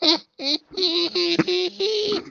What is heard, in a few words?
Ahhaeeeee; lwcars